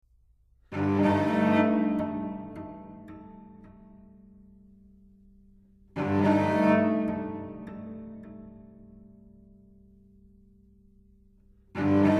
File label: CelloSonata